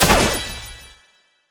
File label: Elementally Effective Damage; SFXBattle